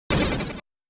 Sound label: Meteor Base Field Fall Sound; Motos